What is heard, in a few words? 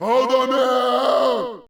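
yell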